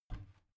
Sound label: bathroom cabinet creak open heavy; objekte; tueren